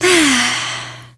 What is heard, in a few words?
Med Sigh, Daughter, Vocalizations; Warframe; Deimos